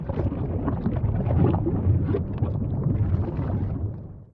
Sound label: lava, liquid; Minecraft; Votive World